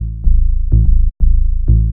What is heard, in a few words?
HOUSE BASS 125 A